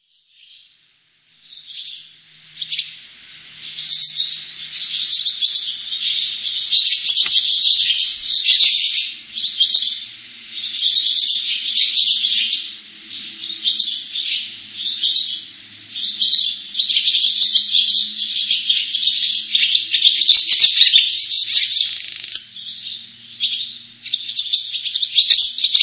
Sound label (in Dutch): boerenzwaluw